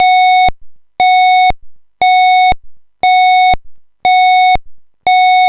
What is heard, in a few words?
tone